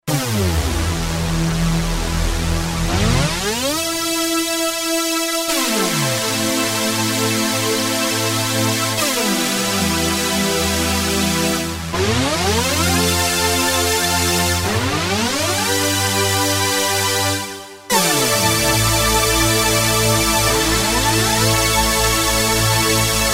Porta Synthex, Roland SR-JV80-09 Session

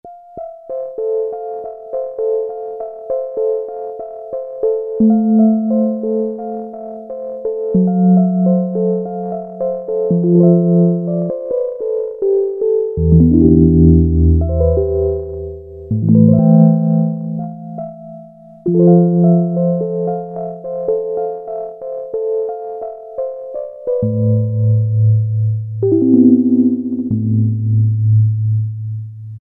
Electric Piano, Korg PolySix; Factory Presets Bank A

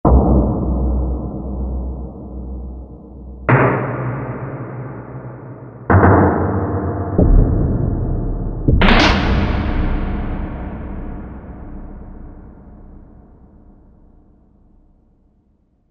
Door Close, Korg MSC-8S Percussion

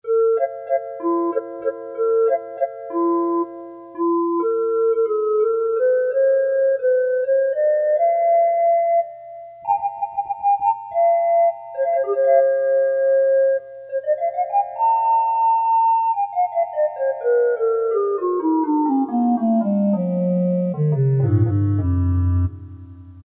panpipe, Casio MT-500; Tones

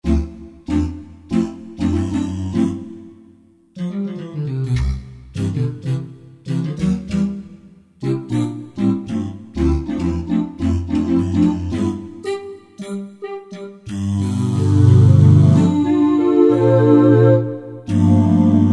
Real Voices, Korg N364; Factory Prog demos

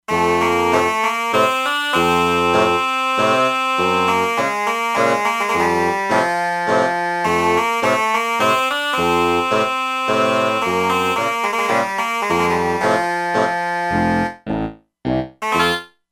Krummhorns, E-mu Proteus-2; Factory Presets

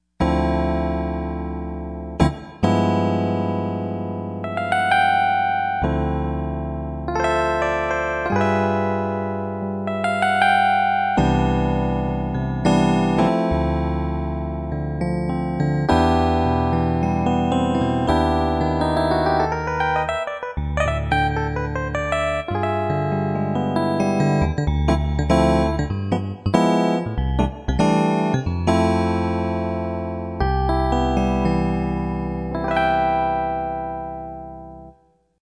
DX E. Piano, Yamaha TG55; Factory Presets

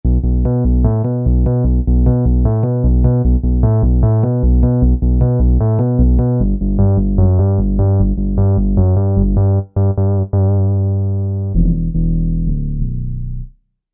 Wave Bass

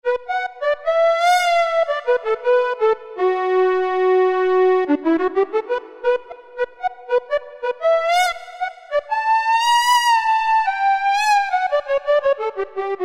Wave Mix, Cheetah MS6

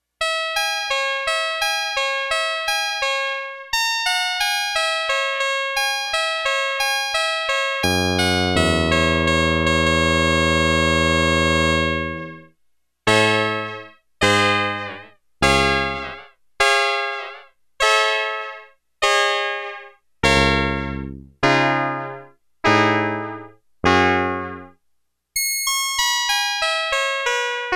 Release Repeat

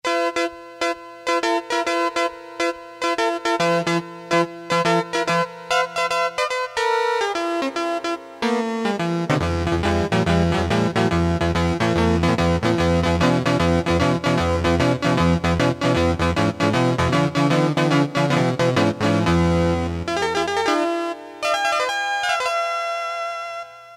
elec. guitar, Casio MT-500; Tones

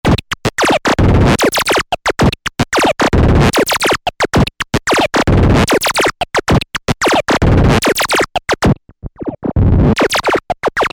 beats

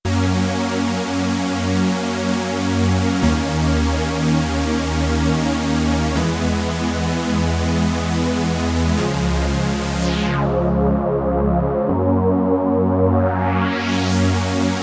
Trancematic, Korg Radias-R

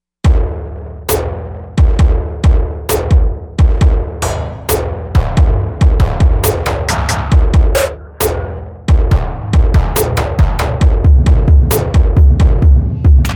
Grind Drumz

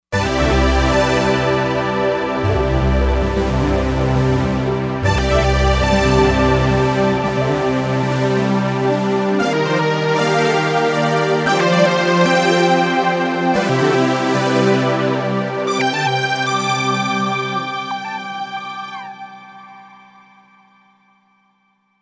Roland Fantom XR

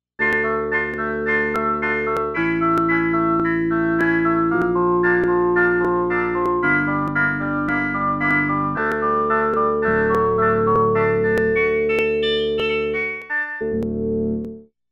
Reed Organ

